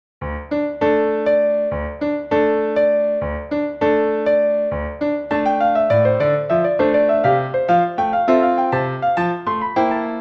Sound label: piano